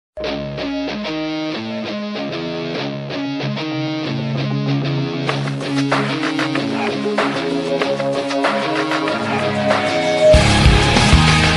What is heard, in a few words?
rock ringtone